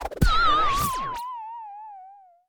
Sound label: lose